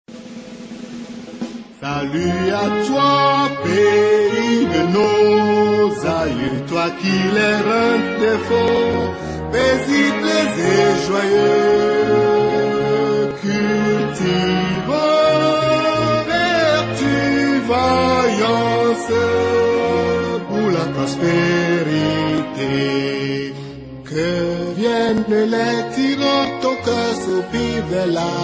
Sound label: National Anthem of Togo Terre de nos Aieux